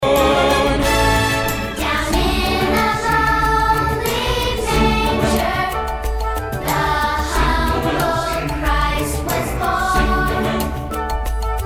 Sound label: Kids Sing Noel